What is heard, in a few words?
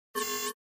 Mouse Over Elect Puls, Mouse Over High Frequency Electronic Rattle